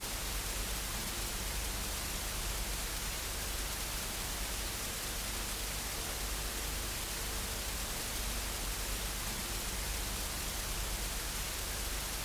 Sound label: mike noise